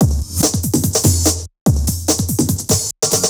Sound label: NRG Break, Trance, Drums, Breaks; Sonic Foundry (Sony Creative Software); Trance NRG VOL-1